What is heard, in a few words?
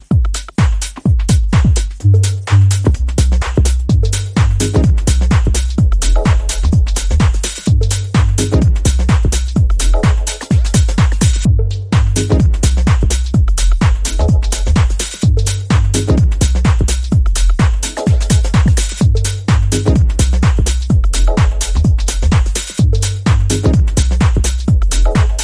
Artistic Techno Rhythm